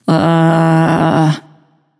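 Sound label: nonverbal seriously; smite; voicepack; izanami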